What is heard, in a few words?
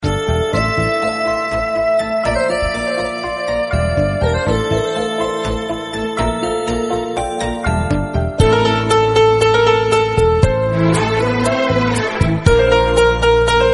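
sad hindi song tone ringtone; bollywood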